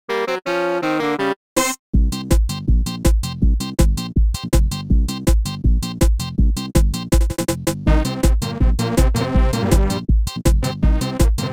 Ska

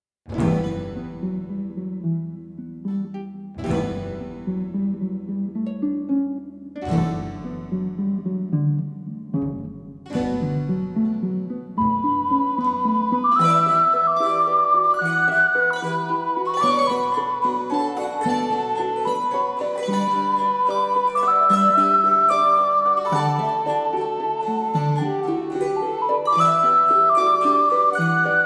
Horn; horn video game original soundtrack

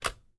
Cassette Click; This Is the Police

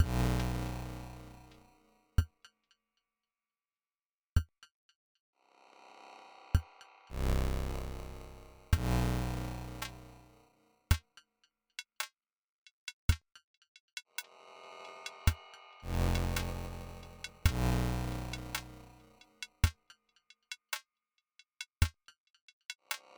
Battle Basic; inscryption

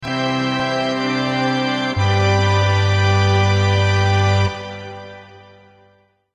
Organ; rpg maker mv

